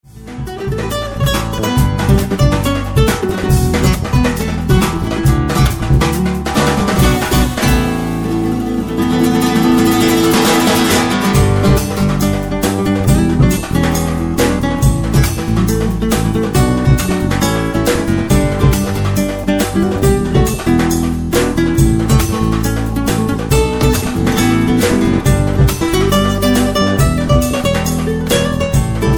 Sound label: Acoustic, Guitar